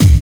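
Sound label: BD, bass drum; X-Static Goldmine 1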